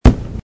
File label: fireworks